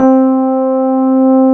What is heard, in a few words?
SUITCASE, Keyboards Old School